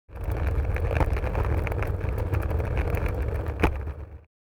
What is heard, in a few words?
Suitcase dragging rolling on wood floor short